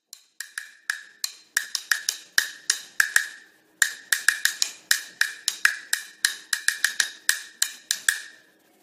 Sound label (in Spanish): Caja china